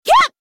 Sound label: attack female; character